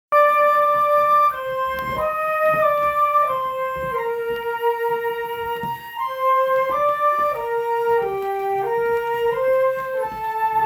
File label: flute choir; The William Allen White Community Partnership; Red Rock State Historic